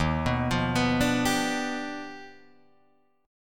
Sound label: Eb Augmented Major 9th Eb down Guitar Open D